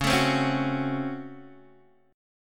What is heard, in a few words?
Db Major 7th Suspended 4th Sharp 5th Db Guitar Open D